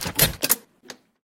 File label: insert lung device